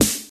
sharp acoustic snare sample g sharp, Royality free snare drum sample tuned to the G# note